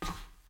footstep metal; Ben 10 - Omnicode

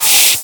steam; Nickelodeon - Capture The Slime

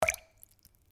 puddle; Dora and Friends - Legend of the Lost Horses

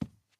ben run step r wood; Ben 10 - Hero Time Game; ThrillGames

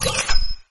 magnet collect; Gotham City Speed

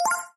Candy Enter; Adventure Time - Gate Crashers

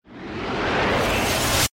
scenario worm dehydratate; Adventure Time - Break The Worm; ThrillGames

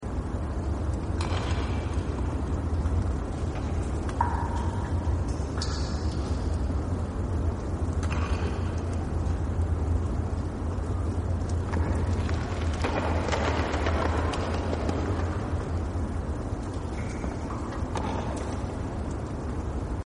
temple ambience; Legends of the Hidden Temple - Unlock the Past

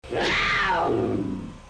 cat scream; Ben 10 - Tomb Of Doom

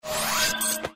Ghost In; Bunnicula - Ghost Guard

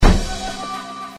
Poof; Bunnicula - Ghost Guard

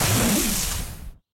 overflow jump; Ben 10 - Omnicode

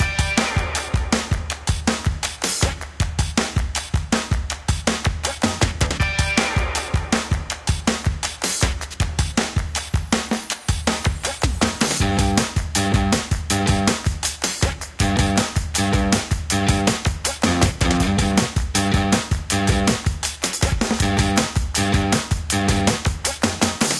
music loop; Ben 10 - Omnicode

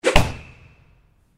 launch orb; Max Steel - Match And Destroy

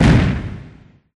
Boom; Teen Titans go! H.I.V.E. 5 Robin Vs See-More

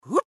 jump; Danger Mouse - Ultimate; penfold

